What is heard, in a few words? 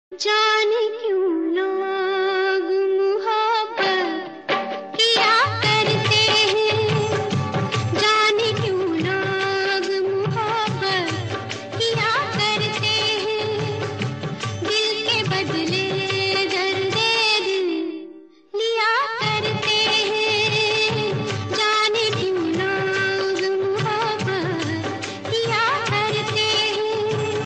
ringtone hindi song ringtone